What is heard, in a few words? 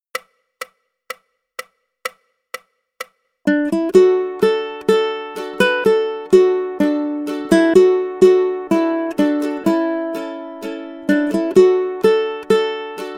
Oh Susanna, ukulele; grade1